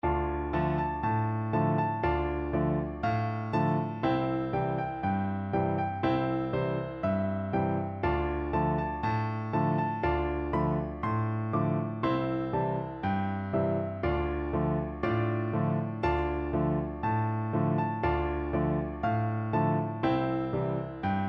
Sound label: drunken sailor, piano duet